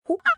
monkey indifferent

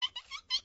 rabbit med

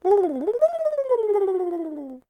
turkey med howl

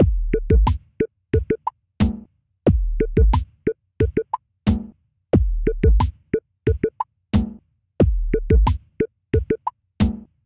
victory

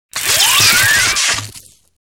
zap radio

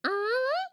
koala long

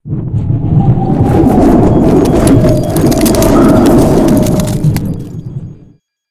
snowsquall start

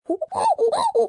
monkey med howl

